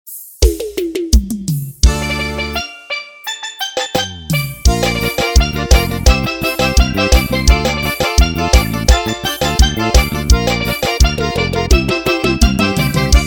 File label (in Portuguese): playback, pisadinha